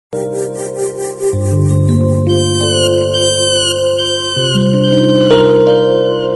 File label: Digital Soft Music